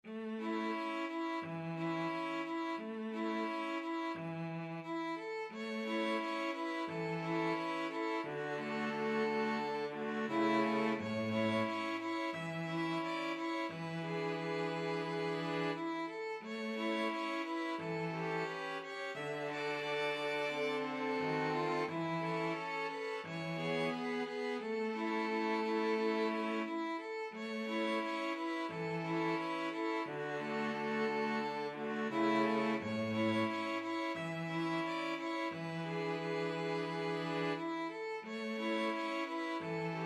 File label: string quartet